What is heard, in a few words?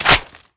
mag in, sound; svencoop; hl2